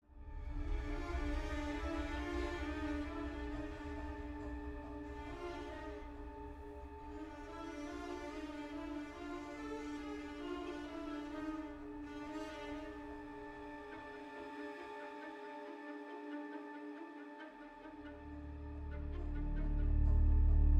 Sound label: tension one shots